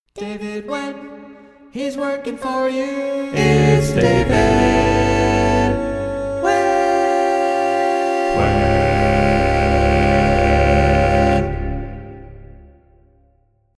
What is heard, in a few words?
jingle